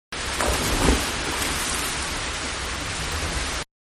Diving-Into Indoor Pool-Low Pitch; Swimming; Miscellaneous Sports; Manhattan Production Music

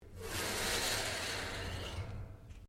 METAL TAPE MOVE

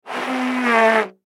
Baritone Airy, Baritone Airy Note Struggle; Cartoon; Musical; Blastwave

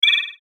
BUTTON FLUTTER; Multimedia; Buttons

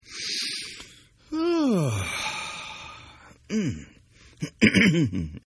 Yawn; Yawning; Voice

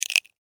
Insect, Claw, Grab, Snap, Movement, Bug; Insect Claws; Blastwave; Animals:Insects

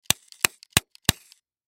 Cap Gun Shoot, Cap Gun Shoot Multiple; Toy; Toys

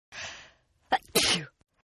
Sneeze Female; Sneezing; Human

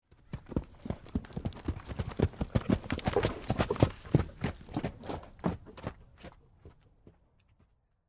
Horse Clydesdale, Pass, By, Trot; Animal; Run; Horses

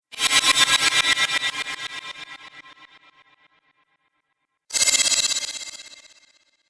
Time Shift Spells, magic

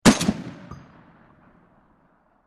M80a3 Single, M80 Single Explosion; Explosions; VRSonic

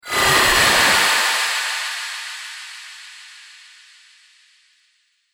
Laser Splash Fall, sci-fi laser sound effect; Laser Future

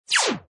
Laser Shot Hit; Scifi Production Elements, Set 1; Science Fiction Sound Effects; Lynne Music

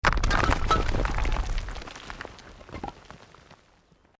ROCK DIRT SLIDE SMALL; Created dramatic effects of buildings collapsing or breaking; Rocks; Materials

